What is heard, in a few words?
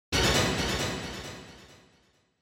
Quiz Question High Delay